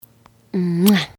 kuss

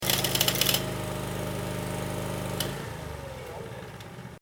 Presslufthammer kleiner

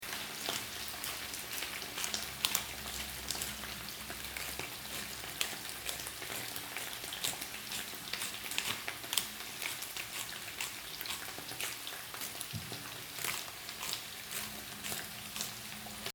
Pfeffermuehle und Bratpfanne

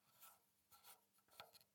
Heizung auf und zu

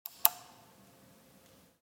Lichtschalter

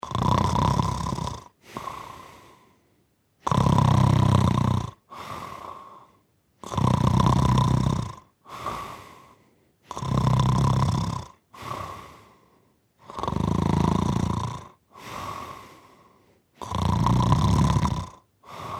vox schnarchen

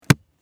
office buch zuschlagen

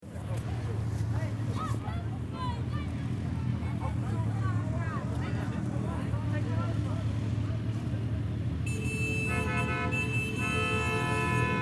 Silvester Hupen Menschen

